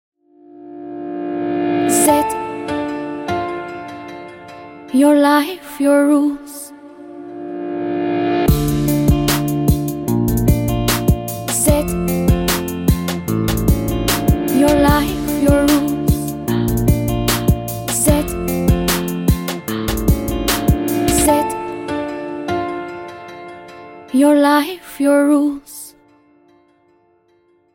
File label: jingle